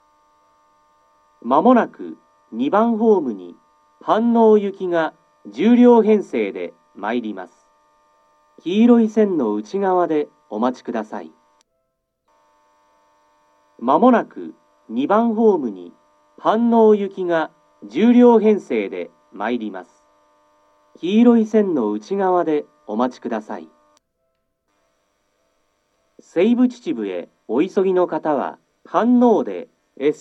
motokaji hanno seibu-chichibu, seibu station

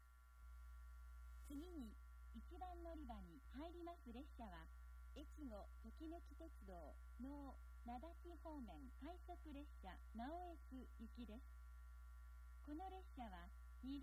rapid naoetsu, itoigawa station; echigo-tokimeki-railway

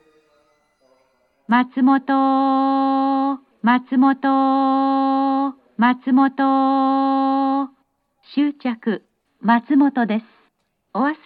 matsumoto stop limited express, station; jr-east; azusa17

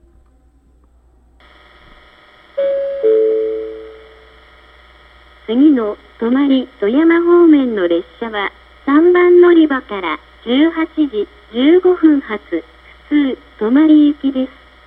local tomari, itoigawa station; echigo-tokimeki-railway

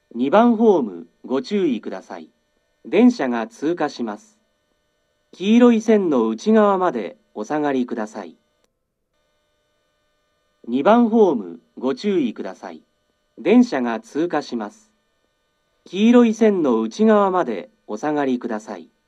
hana-koganei pass, seibu station

